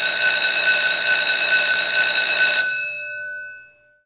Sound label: School Bell